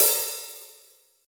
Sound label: crash sound sample f sharp key, drum single hits one shots